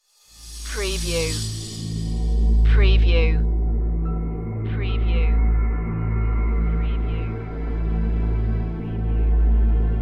courtroom thriller low drone

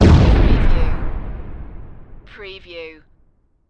Explosion; lynne publishing

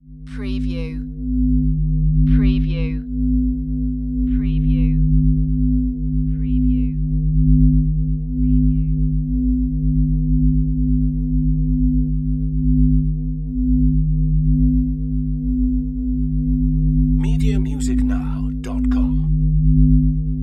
Magnetic Field Drone

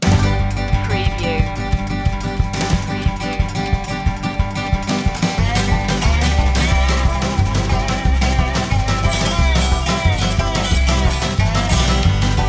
hickabilly hoedown

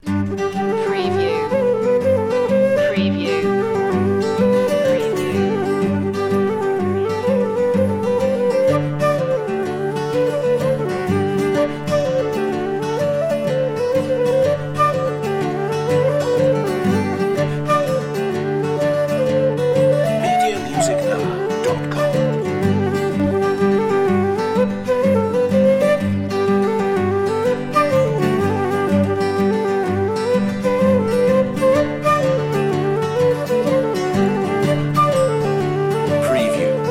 moll row no percussion

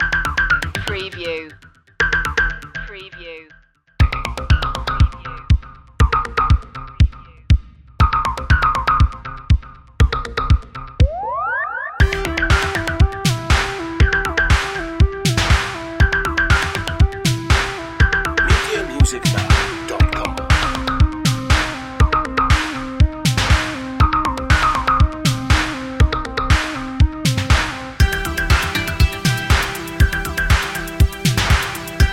vintage electro sound 60s

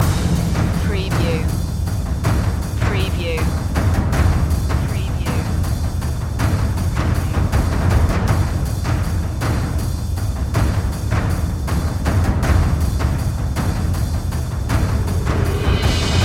lords of chaos percussion loop

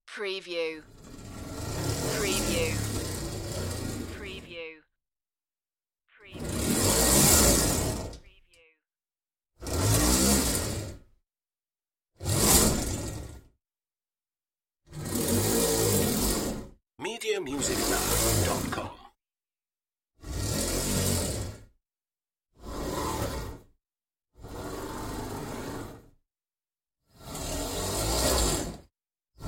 jalousie slide

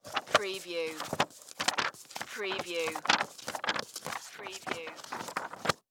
Book Page Flip; sound effects bible

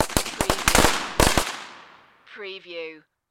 Firecracker Multiple

